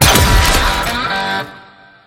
impact; necro; hauntingdead

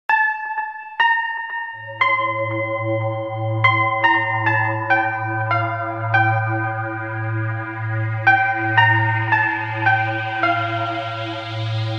music stinger game over lose; Omori sound replacements

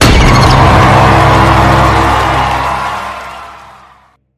lash death slam launch, crowd hype

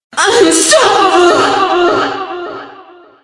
music stinger; killstreak music

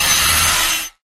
claw hit; drifter; criminality soundpack

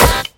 skull hit; necro; hauntingdead

